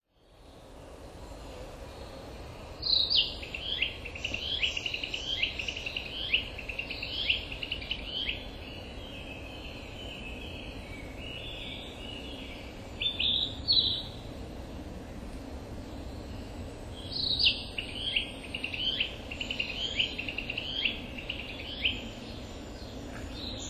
narcissus, birdlistening

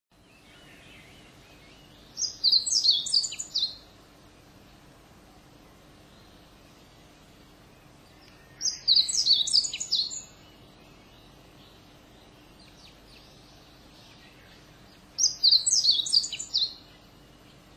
siberian bunting

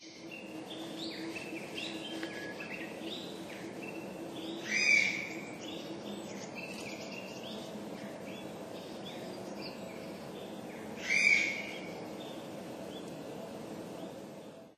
bamboo partridge

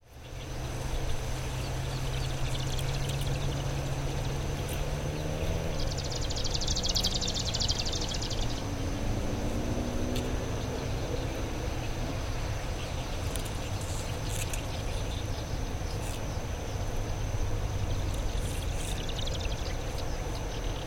japanese marsh warbler

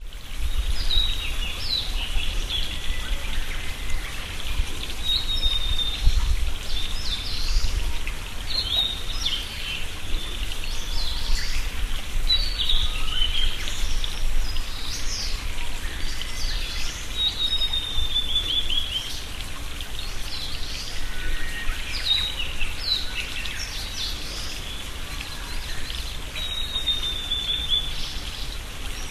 murmuring

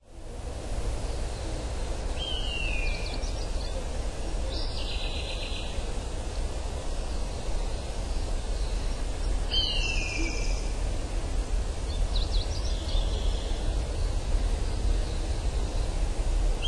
buzzard

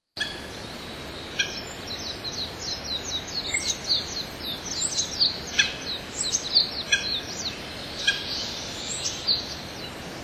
great woodpecker

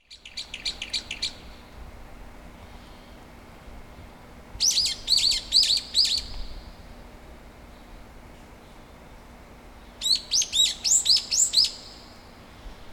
brown eared bulbul

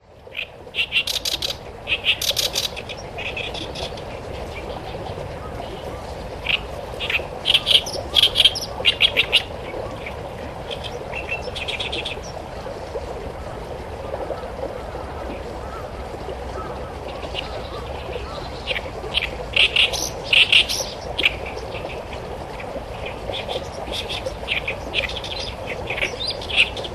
great warbler

